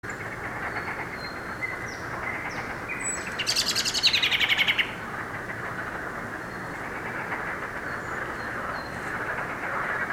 fekete rigó hangja

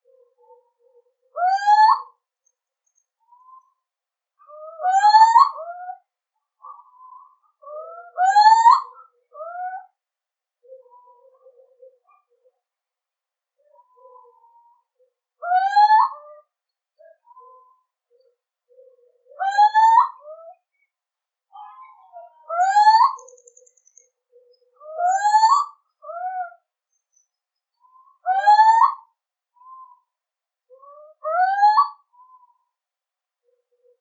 kuvik hangja

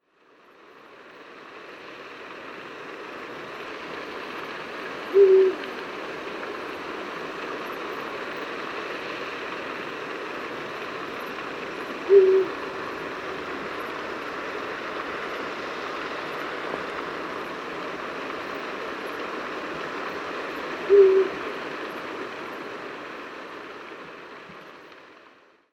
uhu hangja

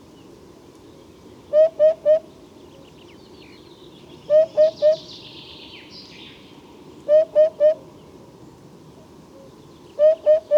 bubos banka hangja

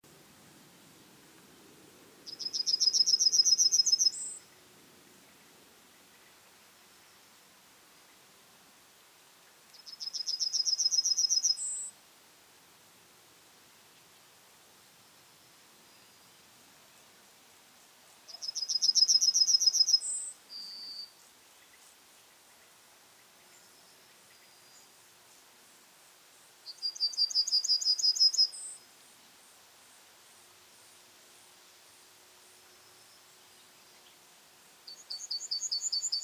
citromsármány hangja

